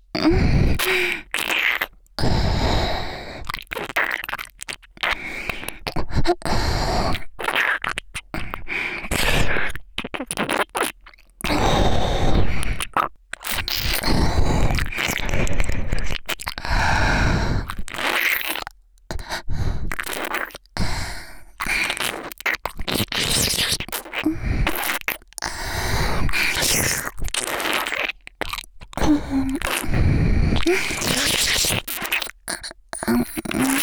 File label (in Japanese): 耳舐め; 手マンコとひょっとこバキュームフェラ; ギャルフェラッ!!学校は援交ギャル風俗店ッ!!